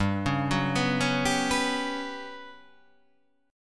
G Major 7th Flat 5th

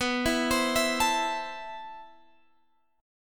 A Minor B, guitar; Am/B arpeggiated

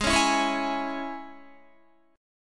G sharp Suspended 4th Sharp 5th G sharp, Guitar; G#sus4#5; strummed; JGuitar

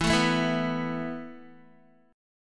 E Suspended 4th E, down, Guitar; Esus4 strummed

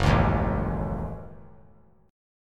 F sharp Minor 6th F sharp; strummed; JGuitar

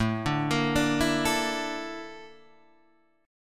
A sharp Major 7th Flat 5th, chord

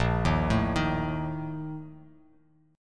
A sharp Major 7th Suspended 4th; arpeggiated

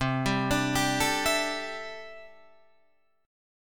C 6th Add 9th C down Guitar Drop D

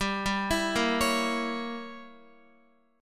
G Diminished 7th, Guitar; arpeggiated; 4F,4A,5C,5G,5C,6E; Gdim7; x,10,7,9,10,9

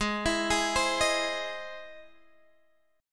E Augmented Major 7th Ab

